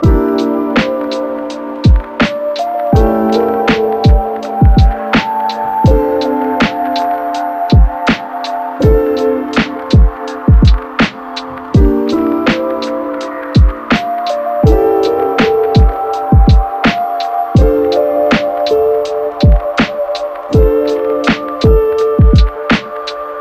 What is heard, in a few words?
beat